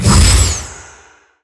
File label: mirror exit